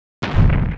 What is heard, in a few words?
ATTACK